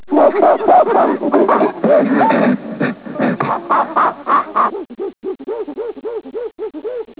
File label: monkey sounds